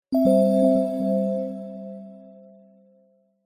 multimedia game sound relaxing game soft twinkling chimes notification